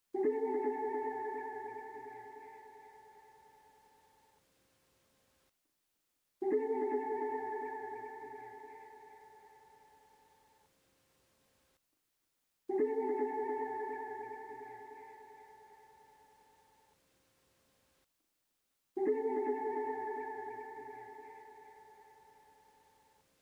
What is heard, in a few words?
153 BPM D Min Guitar Hit; Melody; Reverse; Loop Stems; Cymatics; 2022 Melody Collection - Reverse; Siege